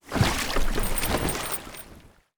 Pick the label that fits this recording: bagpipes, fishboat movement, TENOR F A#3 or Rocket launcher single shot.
fishboat movement